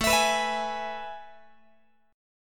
A Major 7th Suspended 2nd, Guitar; AM7sus2; strummed; x,17,19,21,20,19

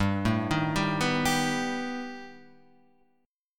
B Augmented 7th G

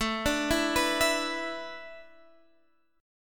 A Suspended 2nd Suspended 4th, Guitar, Standard; Asus2sus4; arpeggiated; JGuitar

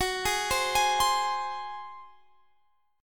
Gb Suspended 2nd Flat 5th Guitar Standard; Gbsus2b5 arpeggiated; x,21,18,17,21,20

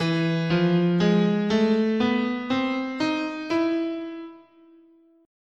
E Double Harmonic Major, scale

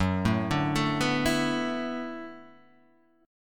D 6th Add 9th Gb

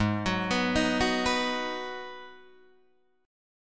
G sharp Minor 7th G sharp, Guitar Open D

